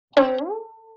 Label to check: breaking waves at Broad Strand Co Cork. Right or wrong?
Wrong